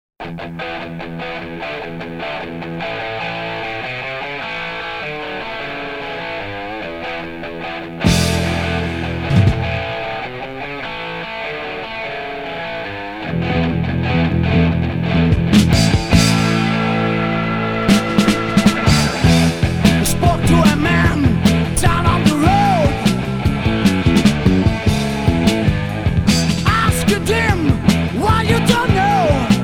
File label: Heavy Metal